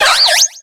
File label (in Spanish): Grito de Shelmet